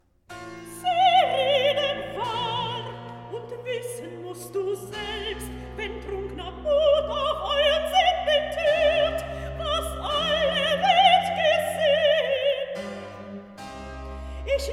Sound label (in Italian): Recitativo; Nitocris, Belsazar; Sie reden wahr; Handel Masterworks; Klassika